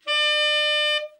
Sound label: TEN SFT, TEN SAX SOFT; Giga Samples Collection